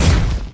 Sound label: Clicky Gadget Things